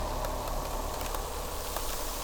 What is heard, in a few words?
Clicks